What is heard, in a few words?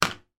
household plastic air freshener put down on surface